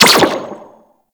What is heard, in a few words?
Sentinel Laser Rifle Fire; Warframe